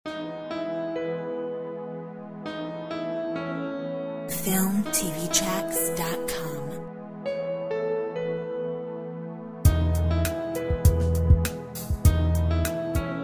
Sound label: Happy strings